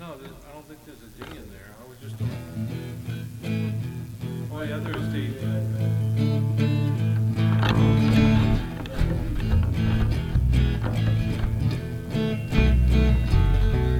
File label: Jam